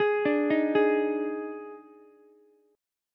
Eb Major 7th Suspended 4th, Ukulele; arpeggiated; 13,14,11,11; EbM7sus4

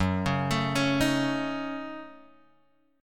F sharp Minor 6th, Guitar Open F; arpeggiated; 6,8,9,8,6,x

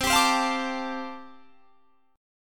G 7th Suspended 2nd Suspended 4th C chord

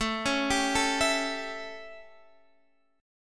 A 7th Guitar Standard, chord; arpeggiated; JGuitar

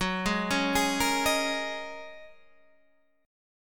Ab 7th Suspended 2nd Suspended 4th, Gb

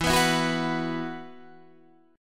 E Suspended 2nd

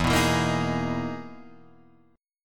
E Major Sharp 11th

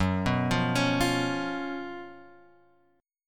Gb Major 7th Suspended 4th, down, Guitar, Open G

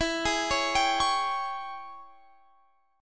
Ab Major 7th Suspended 4th Sharp 5th E

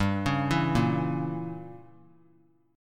G Diminished 7th; arpeggiated; JGuitar

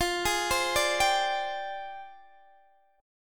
F Minor 6th Add 9th F down Guitar DADGAD

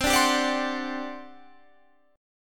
G Major 7th Suspended 4th, C

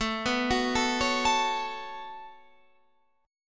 B 7th Suspended 2nd, chord

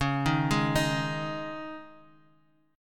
Db Suspended 2nd, Dbsus2 chord; arpeggiated; JGuitar

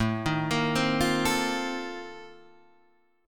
A sharp Major 7th Suspended 2nd Suspended 4th A

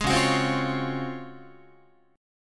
Db Major 11th, Guitar; strummed

